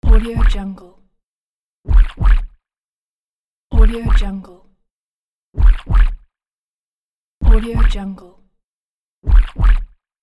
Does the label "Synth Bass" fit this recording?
No